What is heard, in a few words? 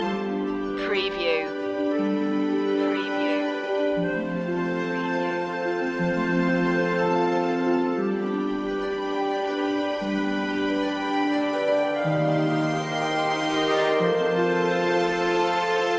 blooming spring short loop